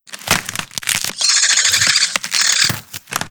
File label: Small Creature - Spawn Up; SoundMorph; Monster Within